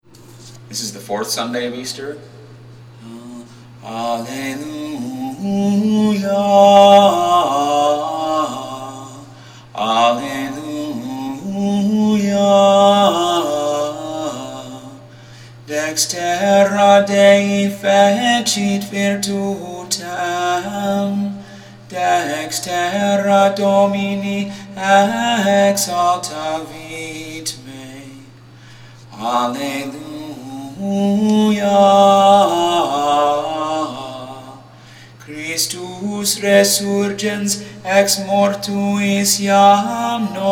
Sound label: Alleluia, 4th Sunday Easter; Propers